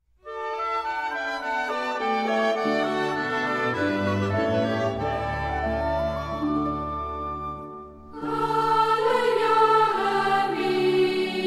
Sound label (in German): Kinderchor